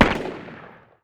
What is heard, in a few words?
ak47 far distant; Modern Warfare